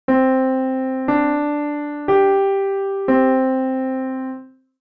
Hard Melody

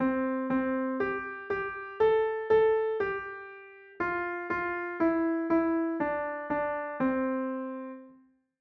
Twinkle Twinkle Major

